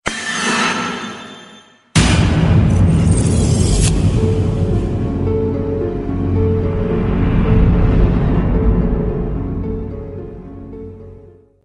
Message Horror

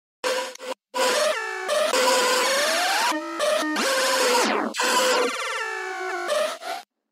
Sms Ghost mix

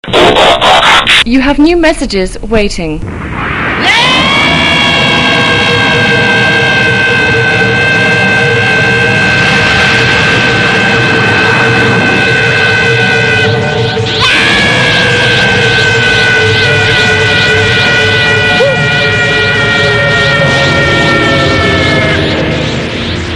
transformation; goku3

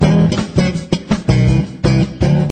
bass

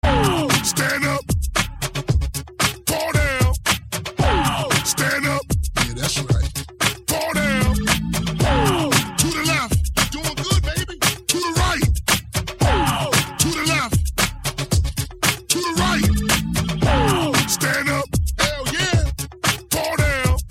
rap; gow cole train

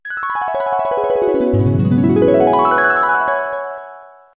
Dream Harp SmS

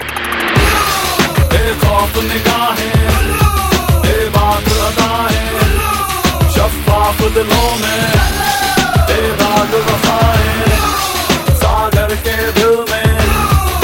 blue tone, ringtones

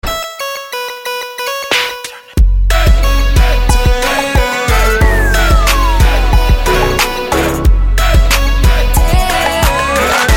Trap Retro

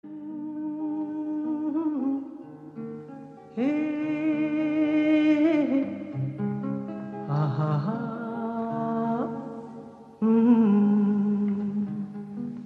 best vocal

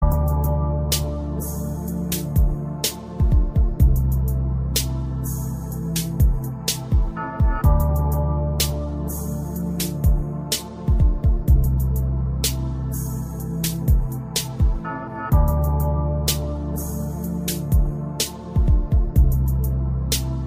Trap

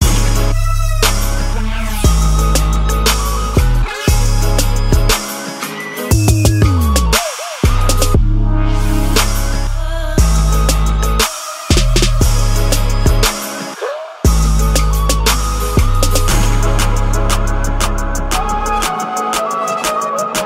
wind remix

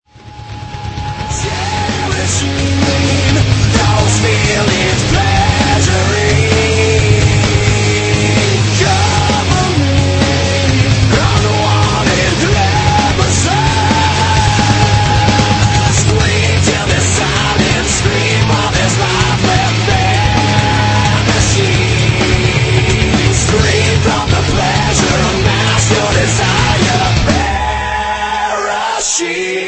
scream

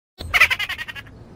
Notification

